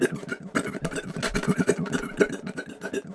engine; punkcroc

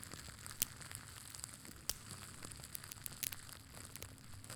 Ambience wood fire; adams venture origins

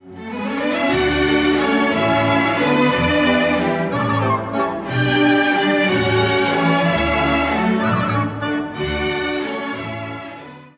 Waltz; Jim is Moving Out; Nutcracker Waltz Flowers

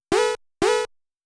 Trampoline Jump; mappy

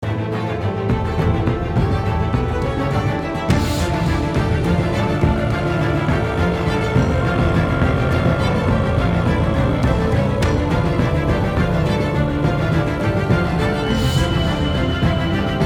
Action Loop

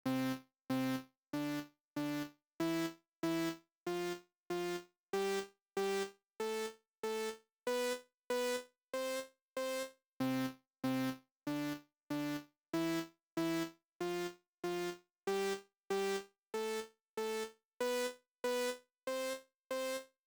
Sound Scale Check; Winning Spike; arcade

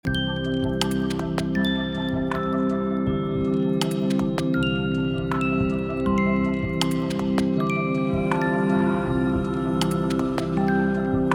Bedroom - Bedtime scene, Ambient; IKEA Smart Home Planner

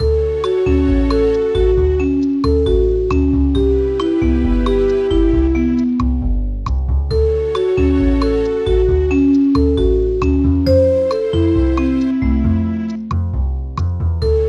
Music Mini Games Neutral

